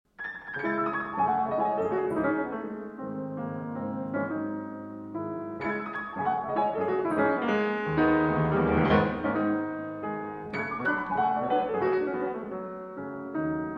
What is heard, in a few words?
Préludes In C Sharp Minor